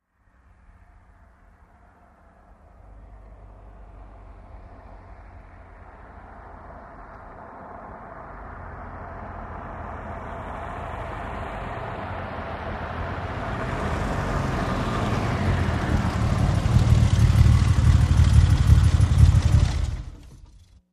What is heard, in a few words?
VEHICLES ASTON MARTIN: EXT: Approach, switch off, slow.